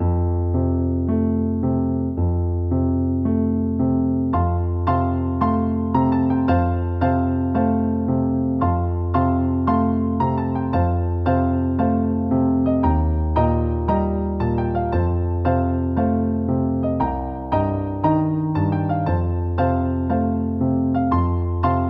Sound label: mini map piano